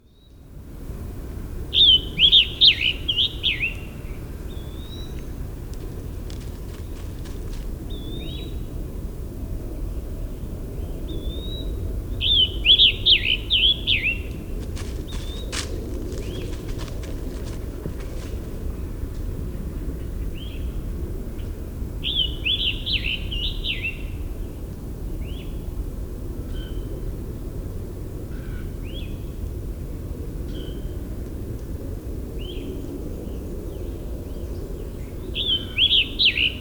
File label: Scarlet tanager; Rattlesnake Hill